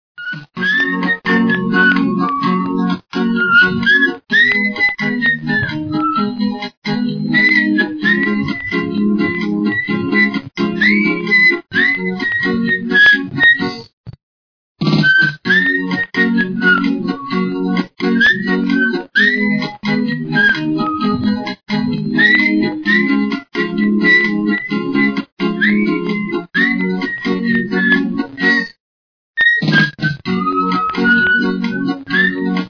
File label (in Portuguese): assobiada